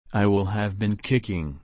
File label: kick